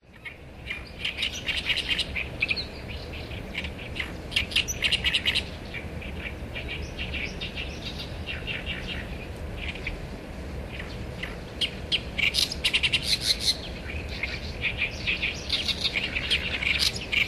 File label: great warbler